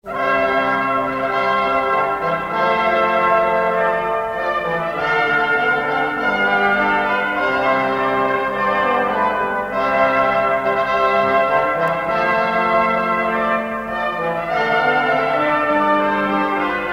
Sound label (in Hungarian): fanfar